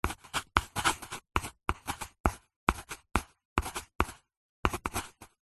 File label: office pencil writing slow on paper; zapsplat